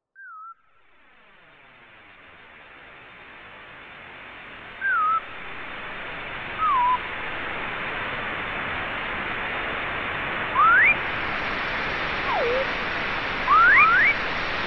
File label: sfx forestry; E-mu Proteus FX; Factory Presets